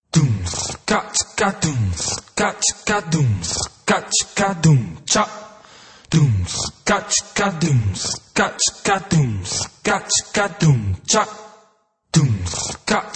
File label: Vocal Three (slow)